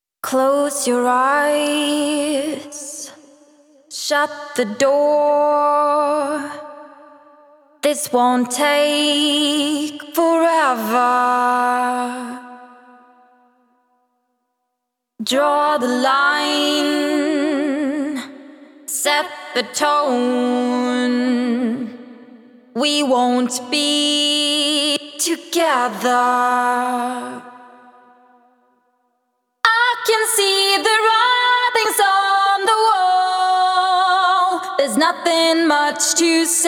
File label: Acapella